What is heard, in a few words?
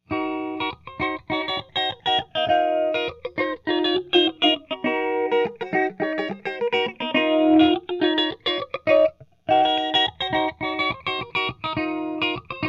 slow n thicc